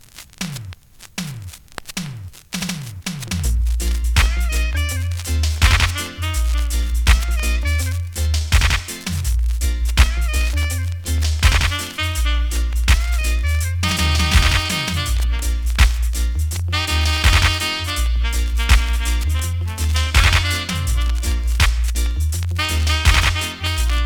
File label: SAX